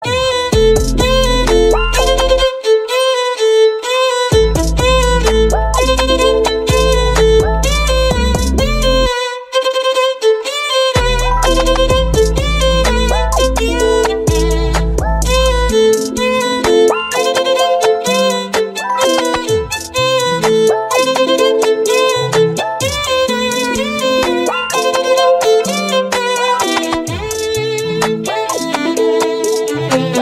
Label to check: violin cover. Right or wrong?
Right